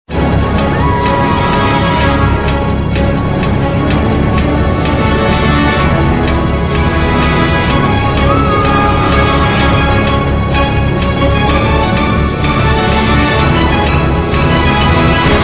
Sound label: start